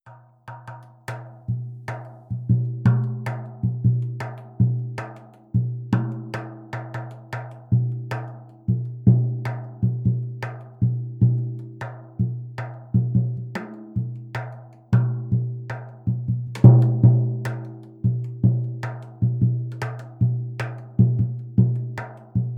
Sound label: samba conteporanea drum sound; brazil